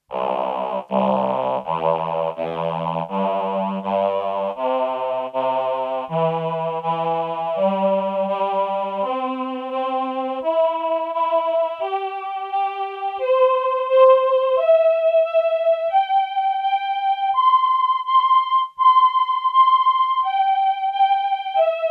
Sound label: VP330 CS340 Male8 Ensemble